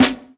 Snare, jungle breaks drum sounds

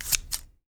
SCISSORS; AKAI S-Series CD-ROM Sound Library VOL-6

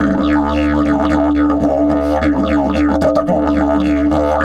108, DIDGE 108BPM

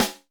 Snr Edged Out, Alesis D4

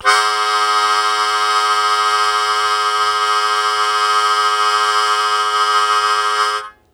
Blues Chord Attack Ab1, Harmonica; Zero G - Harmonica

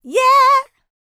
licks, vocal, dance; ILIO; Vocal Planet

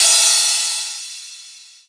Crash, Cymbals

